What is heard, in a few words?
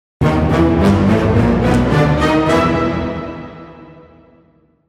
orchestra walk